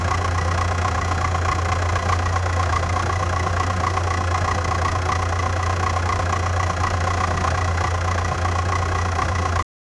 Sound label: truck